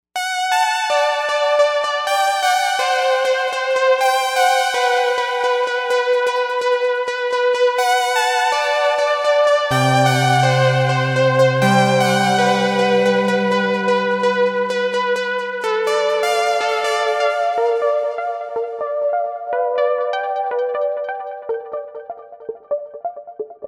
Star Lead; Novation UltraNova; Factory Patches

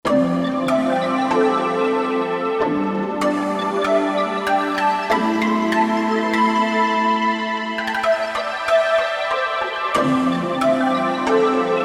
RainForest, Korg MSC-2S Synth; Synth 1

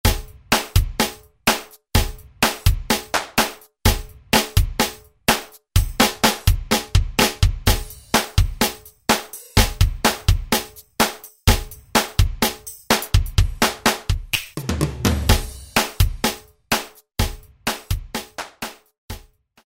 R&B, Alesis SR-16; Preset Patterns

